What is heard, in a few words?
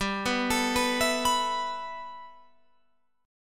G 6th Add 9th G Guitar Open F, chord; G6add9 arpeggiated; 19,18,21,18,19,18